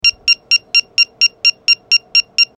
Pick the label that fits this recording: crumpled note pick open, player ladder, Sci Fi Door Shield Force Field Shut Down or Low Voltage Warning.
Low Voltage Warning